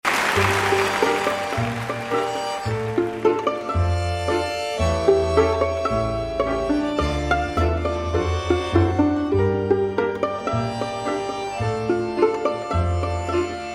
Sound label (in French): les deux guitares